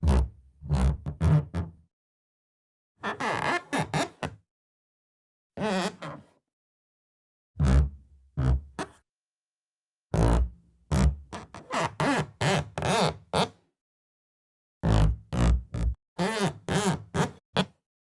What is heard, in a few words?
squeaks and creaks two foot drags on wood table; fvtt sonniss gdc audio